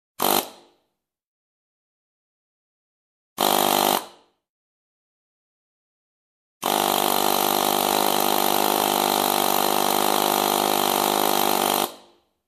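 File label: zvuki pnevmaticheskogo gajkoverta, pneumatic impact wrench sounds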